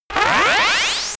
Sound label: short arcade rising, Risers; whooshes rises